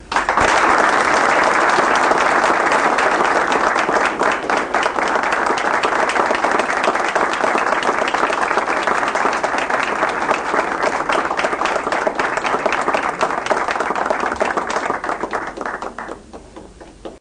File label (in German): Applaus